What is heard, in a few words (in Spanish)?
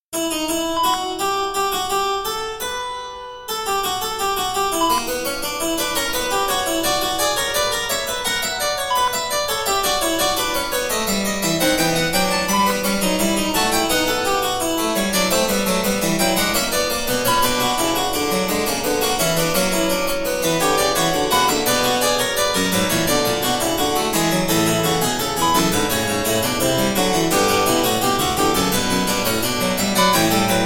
fuga clasica